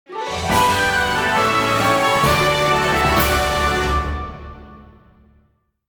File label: Fanfara; SSBU